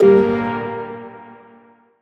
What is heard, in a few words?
tonal oneshot Bb; Pop; Slate; PASADENA Pop Sample Pack; Tonal One Shots